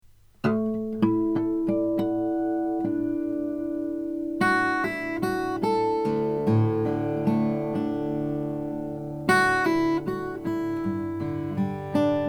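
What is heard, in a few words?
lowden s50 african blackw, guitars; bushmills ltd ed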